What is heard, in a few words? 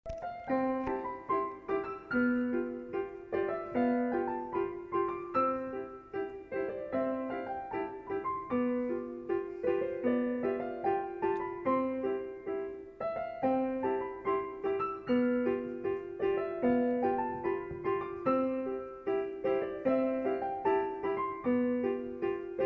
piano